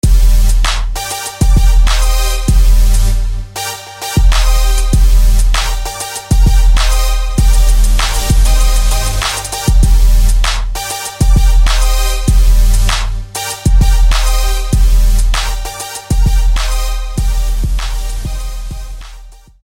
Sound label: Terminal Lead; SFB FX